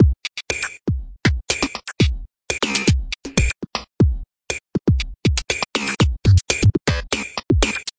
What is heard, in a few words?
120 bpm, glitchy electro hatfun, drum loops and breaks